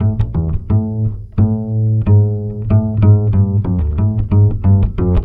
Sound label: BASS, Balkanish Traditions